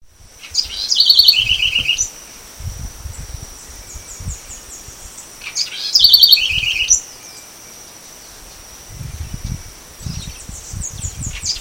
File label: Troglodytes musculus; Faz Mt Verde